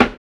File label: LO FI SD, Drums; USB Soundscan vol.11; AKAI